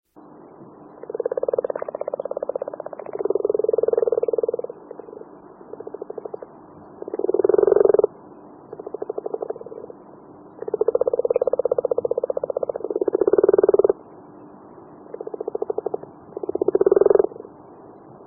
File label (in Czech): skokan hnedy rana temporaria